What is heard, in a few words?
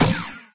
fire med, paintgun; There; ThereClient